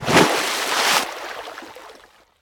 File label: jump; ice age scrats nutty adventure; Piranhas